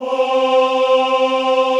OHH C3, Classical Choir; Best Service; Extended Classical Choir

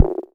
perc mod slappy

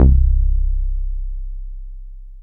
BSE C, BASSES, House

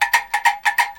ANGKLUNGS; Spectrasonics - Heart of Asia CD2

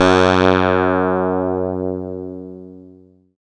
SYN PULS SY, Microwave; Sound & Vision - Gigapack I CD 2

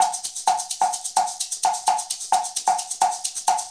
Wood Block and Shaker

